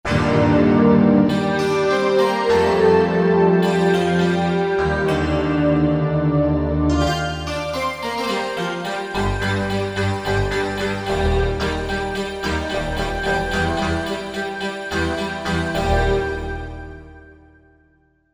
2 voices; Korg MSC-12S Synth 3

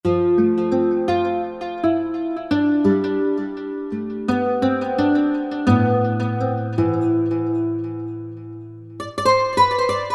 GUITARS Nylon Shimmer; Apple GarageBand 2

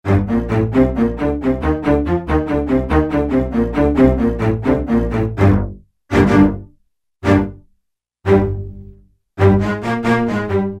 Low Marcato; Roland L-CDX-04 Strings And Orchestral Percussion

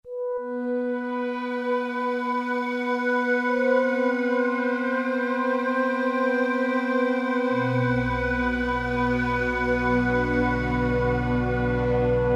Yamaha TG33

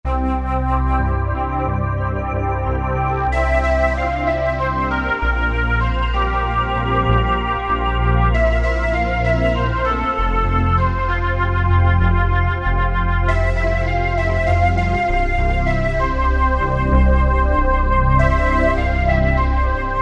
Yamaha TG500; CO Aster

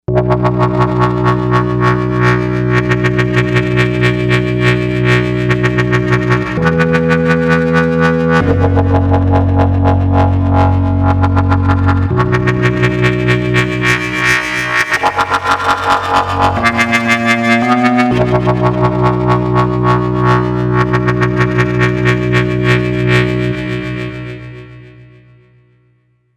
Dub Scotch Tape, Novation UltraNova; Factory Patches

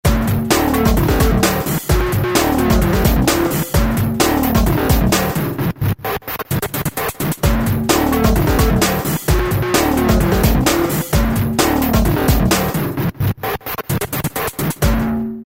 Xplosive, Korg ES-1 Factory Patterns

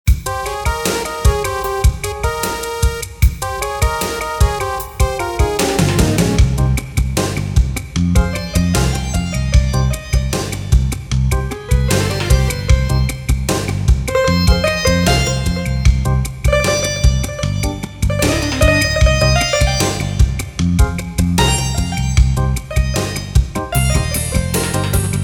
Rai, Casio CTK-601, Rhythms

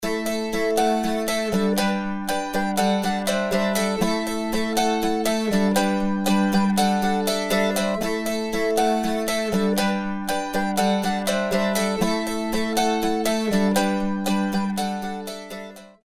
Folk Mandolin; Apple GarageBand 2; Apple Loops

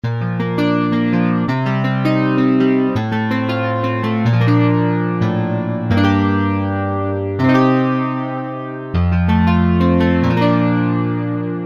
Wave Guitar, Korg Wavestation A-D

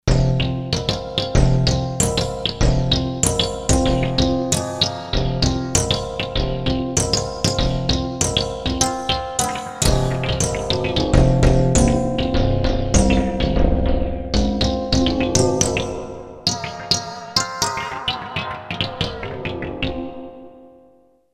PSY. TABLA, Roland SC-880; Factory Patches

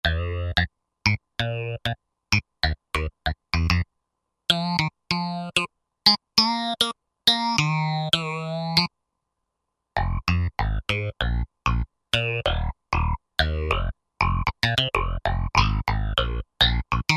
Roland JD-990; Factory Patches; Funky Chicken

